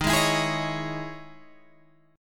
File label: E Major 13th chord